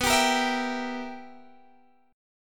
B Major 7th Suspended 4th Sharp 5th B down Guitar Drop D